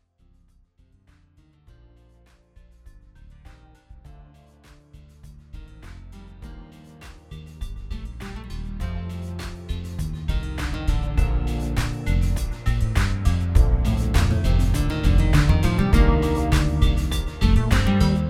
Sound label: Ritm 120; Garmony